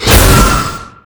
spell mirv cast, halloween; Garry's Mod; tf2

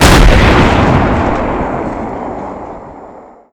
howitzer fire, explosions medium bomb; gbombs 5

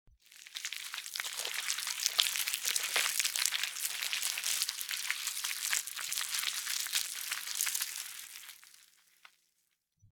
movement, worm; Garry's Mod; hungry worm 2

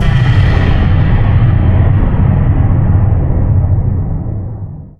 mysterious perc, halloween ambient

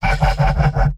laugh evil; pyro; tf2; garrysmod; mvm

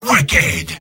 sniper cheers; mvm

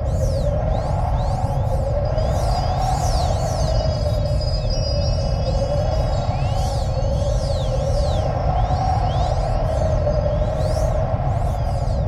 float, halloween; merasmus; Garry's Mod; tf2

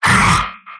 pain sharp; sniper; mvm

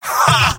scout laugh short; vo; tf2; mvm

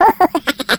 skelly small

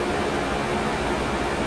machine hum; Garry's Mod; tf2

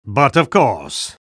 spy cheers; vo

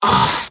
scout pain sharp; mvm

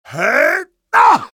heavy taunt head exert; vo; taunts; tf2

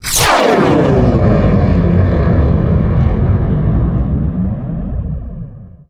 thunder, halloween ambient; Garry's Mod; tf2

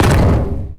sentry buster step; Garry's Mod; tf2; mvm

